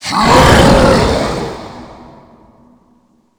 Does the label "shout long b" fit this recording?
Yes